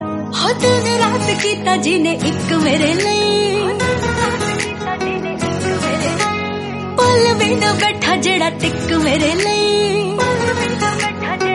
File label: female version cover punjabi